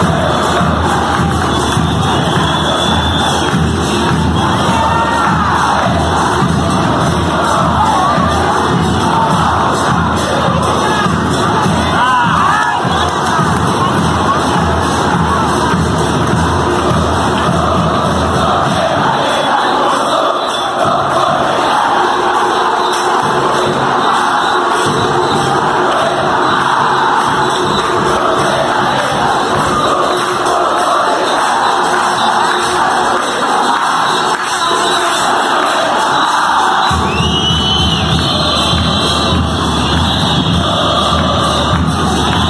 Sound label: chant; yo quemare al alfonso